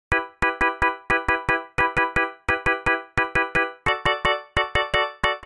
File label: E Minor 88 bpm; MIDI Clips; Tonal; Accompaniment; Ableton; Factory Packs; Chop and Swing